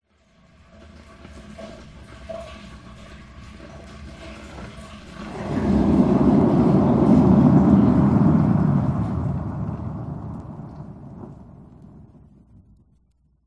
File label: bathtub drain thick dense bubbles